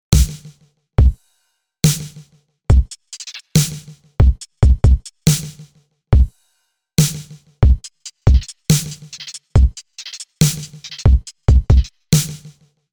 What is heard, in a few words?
Drum